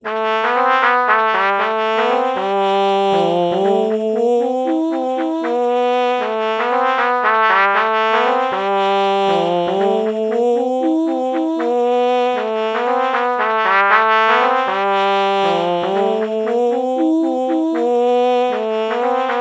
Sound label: oh trumpet melody morph low